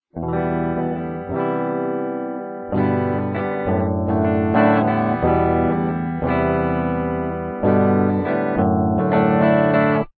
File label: spksim amz clean